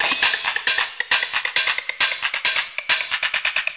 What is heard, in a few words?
134 bpm, climbing filtered, drum loops and breaks